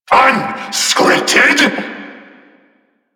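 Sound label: Vocal Investigating Projected; Enemies; Vocals; Warframe; Duviri; Dax; Vocal Set B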